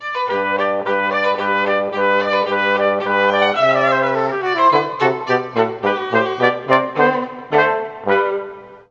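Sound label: Trp Vla Tbn, trumpet viola trombone; Juilliard Music Adventure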